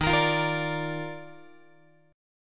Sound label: D sharp Major 7th Suspended 2nd, Guitar; strummed; JGuitar